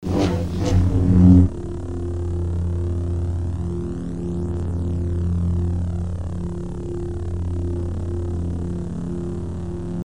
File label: Saber; FXHome